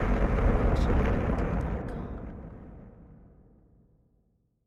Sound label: rumbly explosion